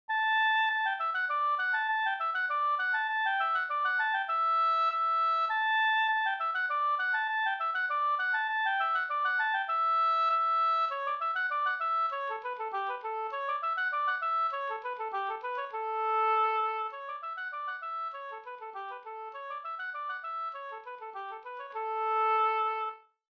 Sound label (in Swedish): halling; e Johan Hallseter Trysil e Mats Berglund